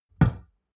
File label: glass door small close damped